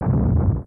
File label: explosion; smw2